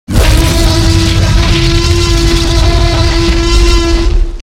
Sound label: tyrannosaurus rex roar